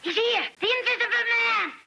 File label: pick n click scene inn barmaid